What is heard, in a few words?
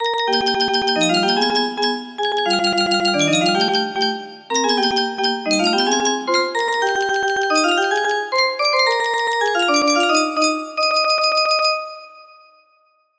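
music box melody; tiki room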